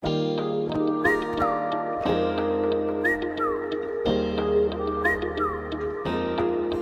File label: Whistle; Maniyarayile Ashokan